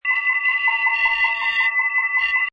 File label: Processing, Sci-fi, computer terminal or robot processing information; Seamlessly looping sound.